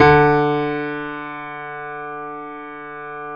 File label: D 2, Keyboards Acoustic, GRANDP ST; USB Soundscan vol.17